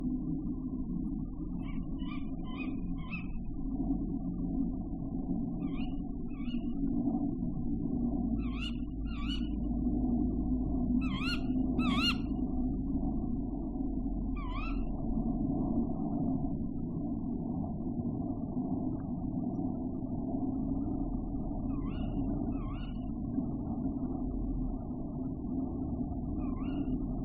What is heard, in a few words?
Tawny Owl